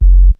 kicks

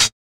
Closed Hats

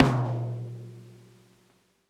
Closed Hats

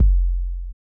Kicks

